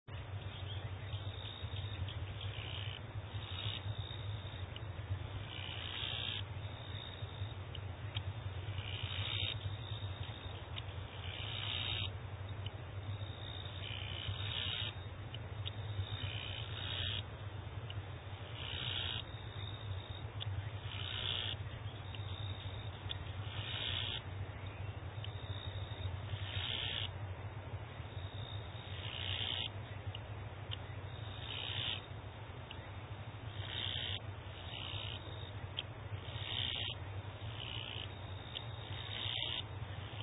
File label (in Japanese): 夏の風物詩、道路沿いの草むらから聞こえてきたキリギリスの合唱です